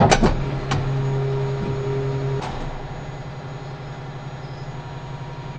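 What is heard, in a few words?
ambience industrial up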